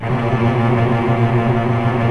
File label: CELLI M TRLL; OMI - Universe of Sounds